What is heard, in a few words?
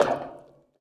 bleacher step; Golden Crayon Sounds